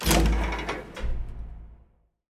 open, iron door; Minecraft; Votive World

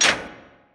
close, iron door; Minecraft; Votive World

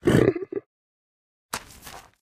say, pig; Minecraft; Votive World

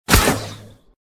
bow; Minecraft; Votive World

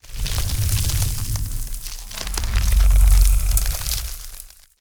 breathe, blaze; mob; Minecraft; Votive World

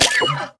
zombie pig hurt; Minecraft; Conquest Default

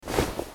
equip leather, armor; item; Minecraft; Votive World

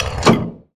stone pressure plate on; Minecraft; Votive World

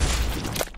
cow impact; Minecraft; Votive Content; wows

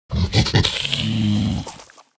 zombie pig angry; Minecraft; Votive World

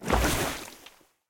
underwater exit; Minecraft; Votive World

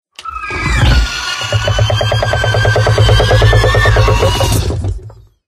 spider sound; Minecraft; Votive Content

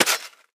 hoe till; item; Minecraft; Votive World

